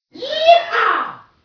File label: Yee Haw Redneck Sounds Car Horn